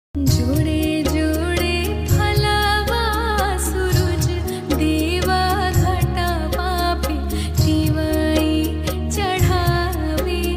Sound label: Ringtone